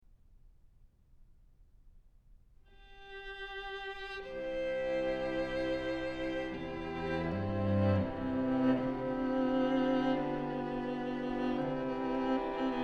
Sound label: String Quartet No. 2 in G Major, Adagio cantabile - Allegro; Ludwig van Beethoven; Beethoven - String Quartets No. 1-6, Op. 18